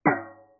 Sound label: Metal Impact